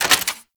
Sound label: Fist Un Equip; Archwing; Melee; Grn Arch Regor Fists